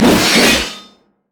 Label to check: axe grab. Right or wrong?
Right